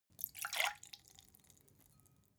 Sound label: glass pour ring; berufe; gastronomie; soundpool